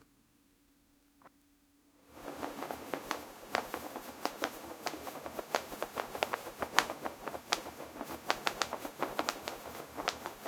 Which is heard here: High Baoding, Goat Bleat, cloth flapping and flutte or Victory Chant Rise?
cloth flapping and flutte